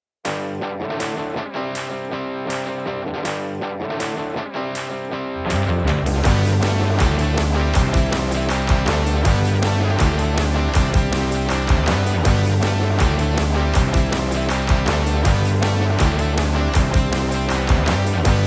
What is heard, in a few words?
Hi Funny Kid; video games